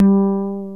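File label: full bass; ZERO-G; Datafile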